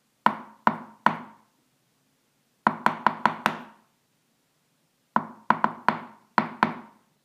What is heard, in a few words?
Knocking On Door